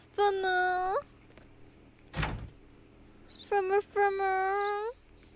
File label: fumu fumu